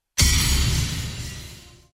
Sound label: Menu Click